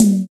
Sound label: studio tom drum g key; tom drum samples